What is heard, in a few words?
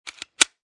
Pistol Semi Auto Rack; Gun Handling